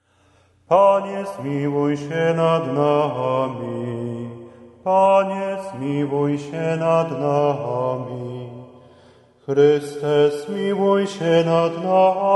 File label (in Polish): Panie zmiluj sie nad nami